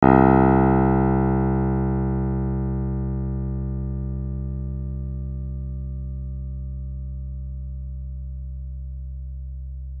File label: C2 minimal, pianos; Modelling sound generation in pianos